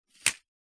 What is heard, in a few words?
leisure toy cars x2 drive crash into each other; hotwheels